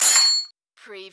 Button Sound - Metal Wrench Drop

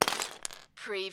Lego, single lego piece drop onto pile of pieces

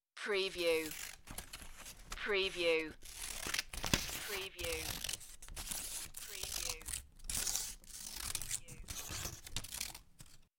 Paper Cutting With Scissor

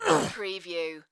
Male Grunt, Human Injury, Pain, Vocal Ugh, Ouch

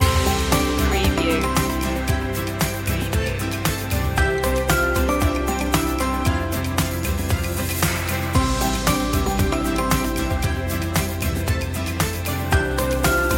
time with you loop

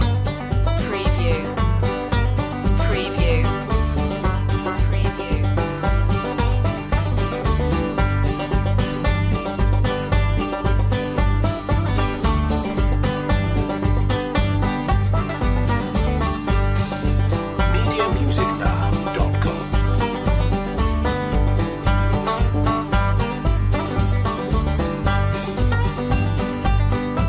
back porch stomp loop

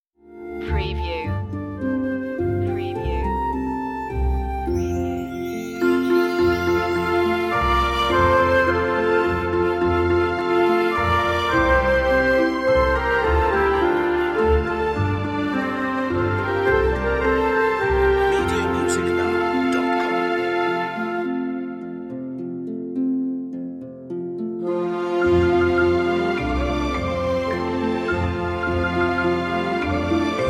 orchestral; the holly and the ivy; media music now

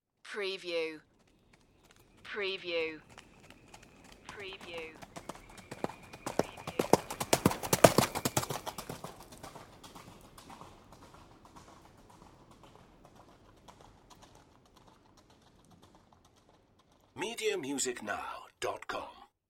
Horse Trot By Gravel; Sound Effects Bible

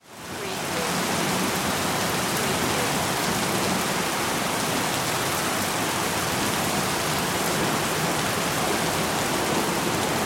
Rain Pour Heavy

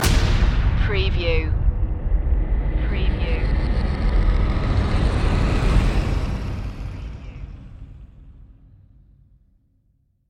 stinger

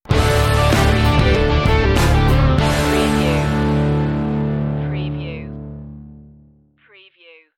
texas rocker stinger

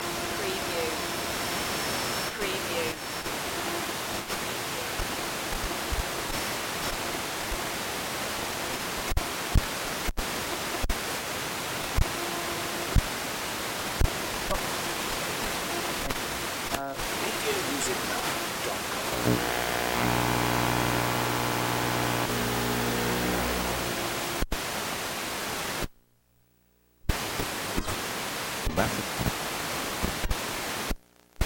Brick Radio TV H Tuning; Sony

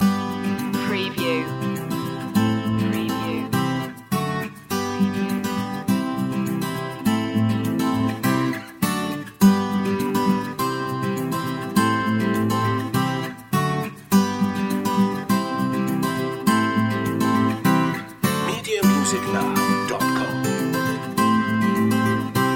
our happy place loop

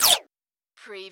CARTOON ZAP

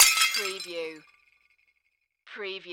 Crash Plate Drop

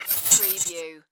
Digital Static Fuzz Warble Sweeper

